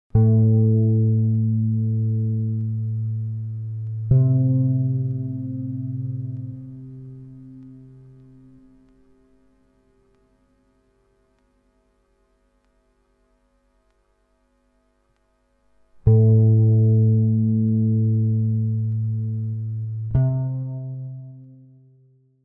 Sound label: call response